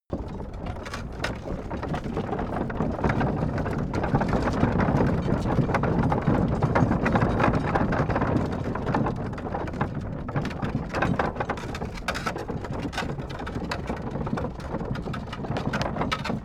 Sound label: carriage drive concrete rattle